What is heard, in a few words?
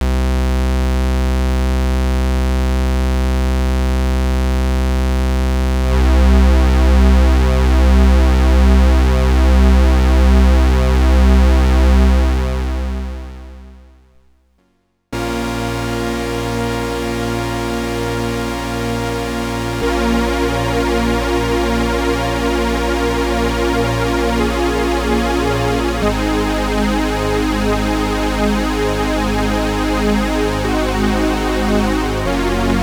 chorus